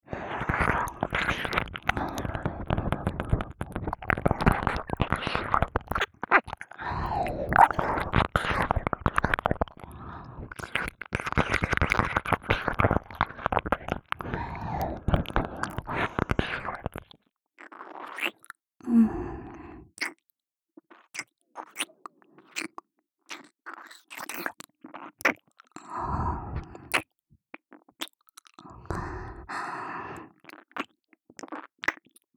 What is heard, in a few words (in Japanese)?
耳舐め R (右耳)